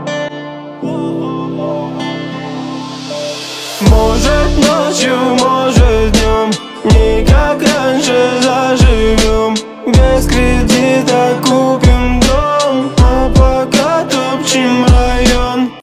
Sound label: Groove